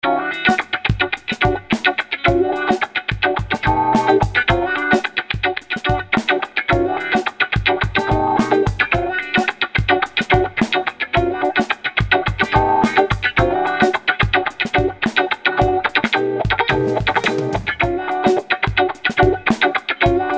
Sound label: Tempo 108 MAXON AF-9 Down